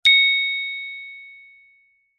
multimedia notification bell chime ping tone high pitched